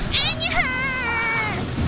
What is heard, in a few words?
anyaaaaa